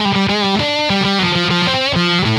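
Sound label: Distortion Riff Guitar; Guitars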